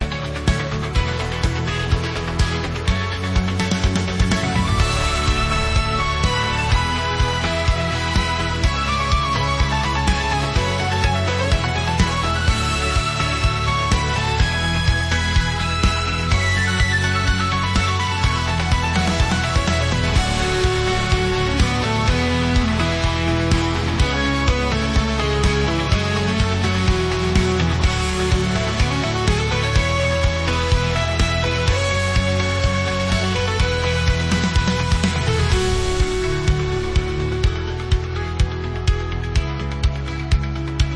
Pop rock

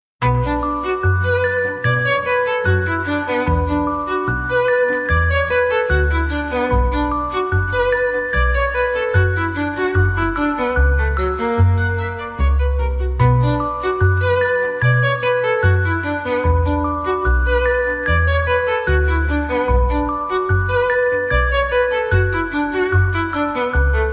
Classical for kids

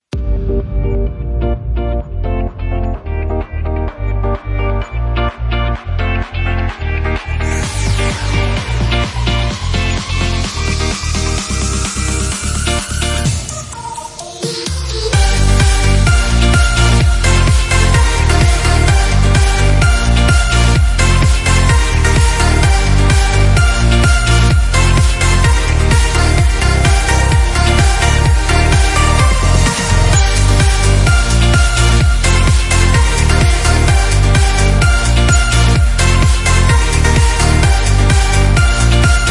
Energetic summer ringtone

